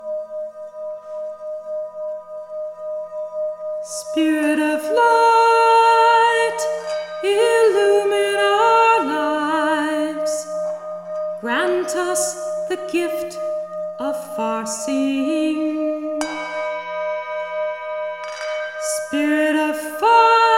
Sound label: Chant With Tibetan Singing Bowls